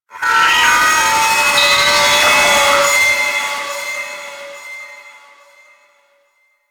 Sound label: Attack Song; Warframe; Teralyst; Vomvalyst